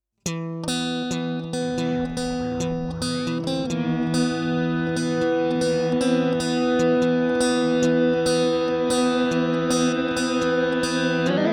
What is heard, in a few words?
Superego plus Multimod Delay